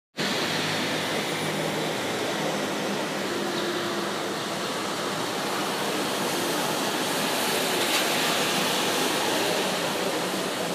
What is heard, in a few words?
modular synth rain